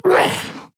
Grunt Attack; paladins; Drogoz

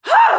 Grunt Physical; Realm Royale; Mage; Kunoichi

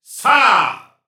Warrior Grunt Physical; Realm Royale

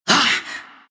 pain, ru; dota2

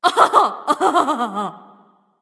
laugh; Oktoberfest Nu Wa; Smite

